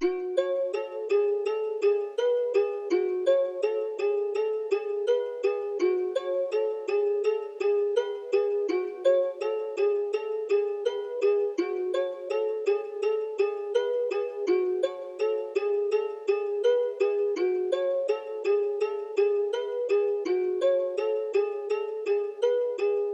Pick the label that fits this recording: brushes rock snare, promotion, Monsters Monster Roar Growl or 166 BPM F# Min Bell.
166 BPM F# Min Bell